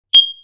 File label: chirp